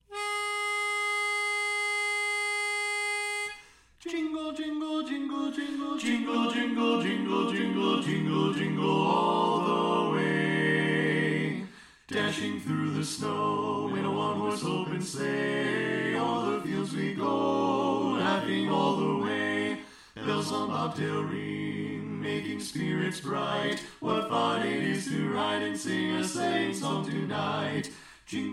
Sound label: Jingle Bells Bari Left; Christmas show